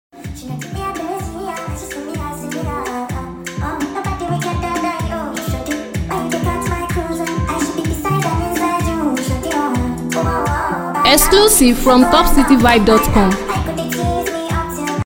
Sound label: Sped Up